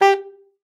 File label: G4, SAXOPHONE; Session Instruments; Best Service ProSamples vol.42